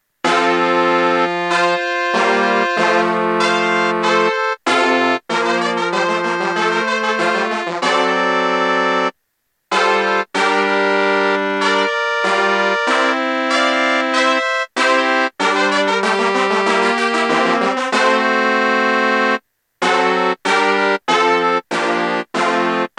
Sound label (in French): fanfare pour trompettes trombones; Carpentier, Rony